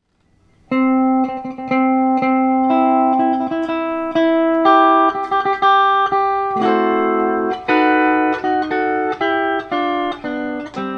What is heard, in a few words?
wedding march steel